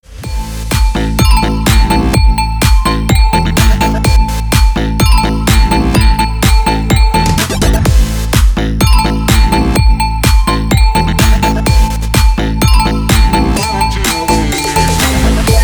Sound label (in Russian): zvonkij gromkij trek remix